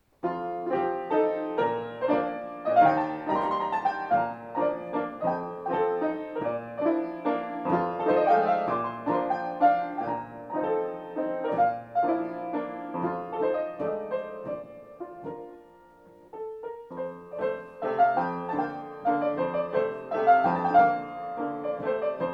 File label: Chopin Mazurka Si Bemol; ConcertToulouse